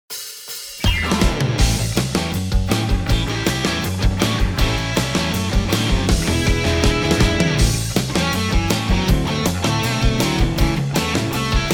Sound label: Rock Arrangement; SMW